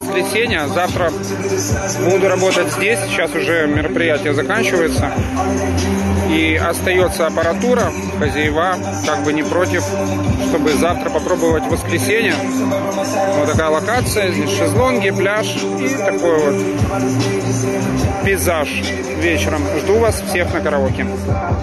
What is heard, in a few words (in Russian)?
Караоке на пляже; Караоке Одеса